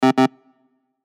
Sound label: wrong sound